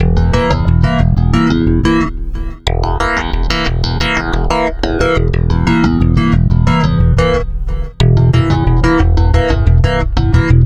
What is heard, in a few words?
Stick Loop, Stick Bass; Sonic Foundry (Sony Creative Software); Leo Cavallo Bass Taster